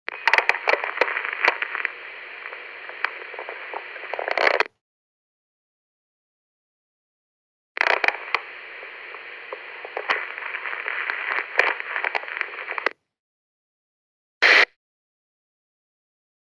SHipenie raccii schelchki

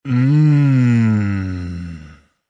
Udovolstvie muzhchina

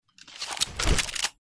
Rezko vytaskivajut oruzhie

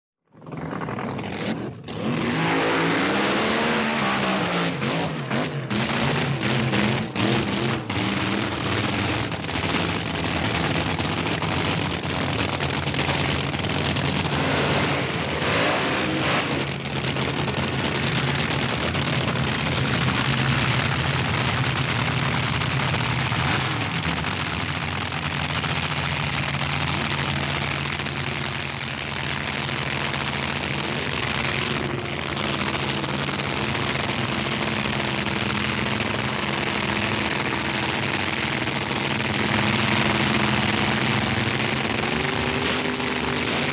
dragster

